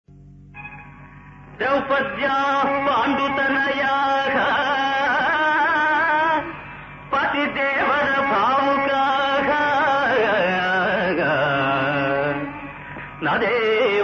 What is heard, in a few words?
Slokam; MahakaviKalidasu(1960); Drowpadya